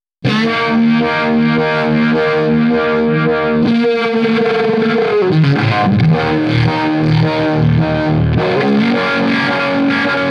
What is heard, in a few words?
Fuzz Phrase; RetroVibe